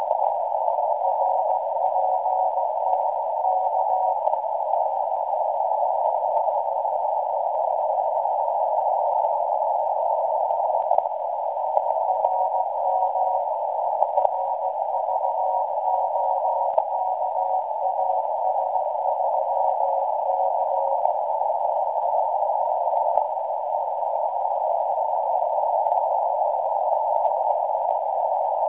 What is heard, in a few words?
Navy Broken CW